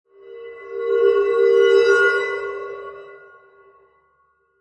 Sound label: Crystal Feedback, Scifi; Scifi Production Elements, Set 1